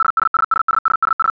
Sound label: computer